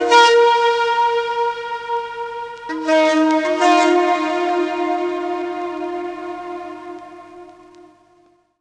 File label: Calling Horn; Jungle; Blu Mar Ten - Jungle Jungle - 1989 to 1999 Samplepack